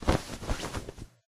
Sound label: rustle